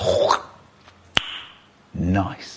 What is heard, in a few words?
click nice